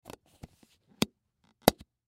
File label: Tupperware Lid, Tupperware Lid Close; Foley; Kitchen; Household